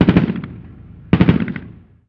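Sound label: gun assault medium; Call of Duty Modern Warfare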